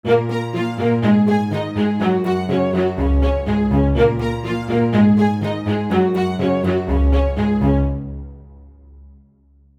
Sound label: strings